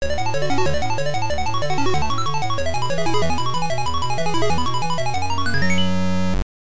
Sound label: Groops Victory; Binary Sciences